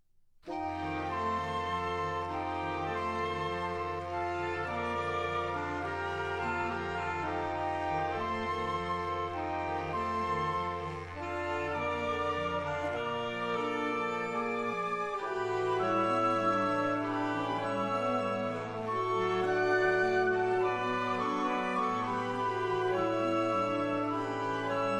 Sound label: bongos brass; borodin; The Band of the South Australia Police